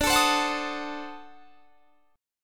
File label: D 7th Flat 9th D down, chord; D7b9; strummed